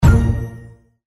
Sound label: hammer land; Crystal Furnace